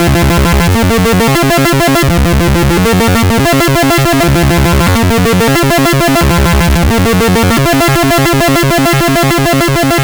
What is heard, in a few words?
minimoog; quitch